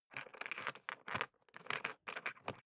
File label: briefcase plastic latch lock key turn ringing